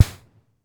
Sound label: Snare Reverb, Vermona DRM1-MK2